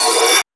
FLANGE; E-Lab; X Static Goldmine 1

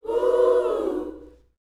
YOUTH CH PHRASE, Vocal Planet Gospel

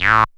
SYN TON; E-Lab; X Static Goldmine 2; akai

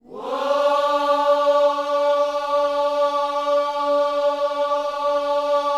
WHOA D 4, Vocal Planet Gospel; Spectrasonics; Vocal Planet Vol.1 Gospel; akai

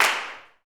CLAPS, Gospel; SNAP; Spectrasonics; Vocal Planet Vol.1 Gospel; akai

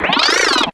toon

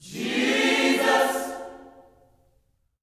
JESUS CHD, Vocal Gospel; Spectrasonics; Vocal Planet Vol.1 Gospel; akai

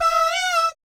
BAYEEAT, Vocal Planet Jazz Fx; Spectrasonics

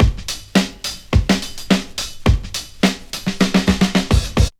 D3 BREAK

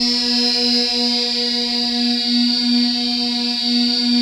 ATMO PADS; Best Service; XX Large

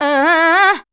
voice spectral, ly short

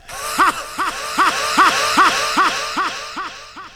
VOC

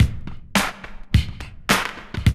LOOP; E-Lab; X Static Goldmine 2; akai

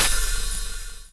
OH; E-Lab; X Static Goldmine 2; akai

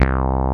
SYN BASS; ZERO-G; Datafile

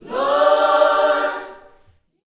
Vocal Gospel PHRASE

